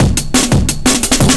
Breaks, 175; Drum&Bass; EZ Rollers

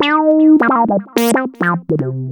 lead (brass); Sonic Foundry (Sony Creative Software); Ugly Remnants VOL-2; Leads

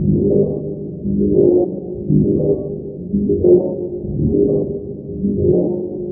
ORCHFX, orchestra, Asian Traditions; USB Soundscan vol.60; AKAI

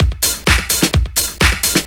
Straight Loop 128 BPM, House; Best Service; House Definition VOL-1

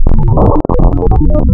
PROPHET VS, wavetables, Classic Synths

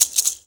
MULTI RATTLE; Best Service; World Colours

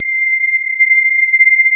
SPARKLES; Wizoo - Powered DX

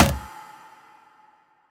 Percussions

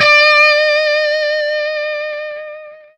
SINGLE D, Vintage Blues Guitar, ELEC.GTR; AKAI; USB Soundscan vol.22

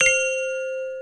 BELL CRACK; Back In Time Records; Big Bang

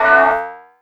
Bell

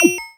Shard Glimmer Blip; Cwejman S1; DevSnd Cwejman S1 - JK

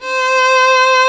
VIOLINS C4, LEGATO VLNS; Instruments & Percussions; InVision Interactive; Lightware VOL-1

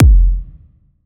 kick verb; kicks

